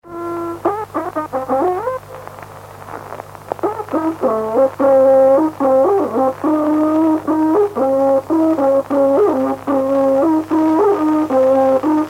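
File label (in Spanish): Toque de trutruka, Mapuche; Música Mapuche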